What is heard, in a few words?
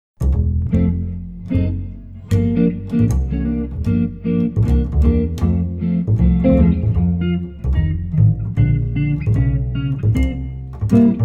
Jazz Duo Jazz Swing; Boston